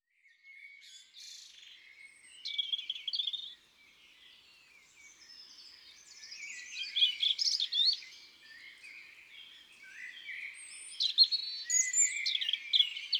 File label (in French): Rougegorge familier, Chants d oiseaux des bois et des forets